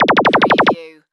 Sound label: Laser Machine Gun; Sound Effects Bible